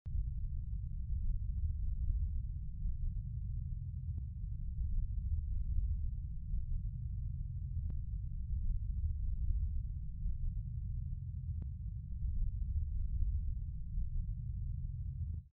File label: dry air short indoors